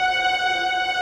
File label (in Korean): 스트링; 音MAD; 少女终末旅行; 소녀 종말 여행 음원팩